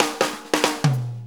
Shuffle Fill, Drum Tools

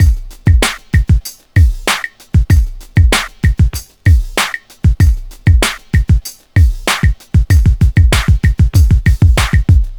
Beat, club; Sonic Foundry (Sony Creative Software); Club Joints and Street Anthems; Toxic Rain

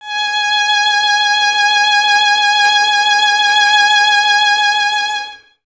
violin; Miroslav Vitous; Solo Instruments VOL-2; Solo lnst. II

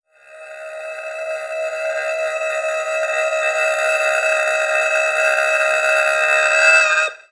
TURBOSCREAM; Rarefaction - Poke in The Ear With A Sharp Stick

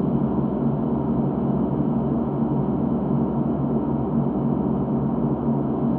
InfiniVerb Voice, sparse soundscape Loops

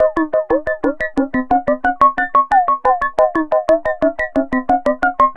Serge, Synths Arpeggios; Electronic Point Blank

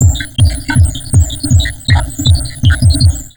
160, Drum Loops Crazy Processed

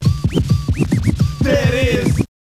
scratch short, Vinyl scratches

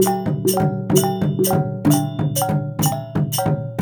WYANG L, Heart of Asia

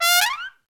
SOLO TRUMPET

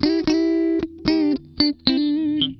Funk Guitar; Zero G - Funk Guitar

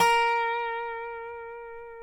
DOBRO NOTES; Country

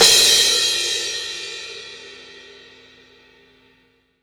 CYMBAL, CRASH; Sonic Foundry (Sony Creative Software); Loops & Samples Drum Tools; Laid Back

